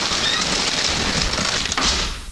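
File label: ELEVATOR DOOR; FRANK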